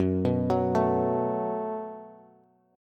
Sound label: F sharp Minor Double Flat 5th F sharp, Bass; arpeggiated